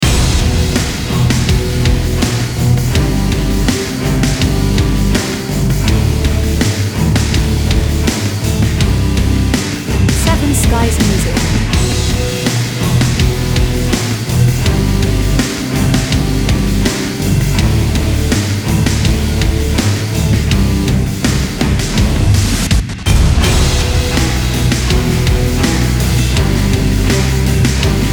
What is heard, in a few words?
Rock Underscore (Loop)